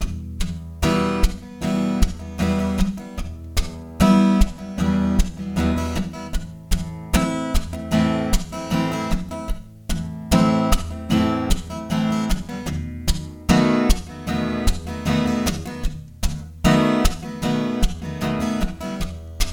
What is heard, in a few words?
Backing Track - Em - Am - B7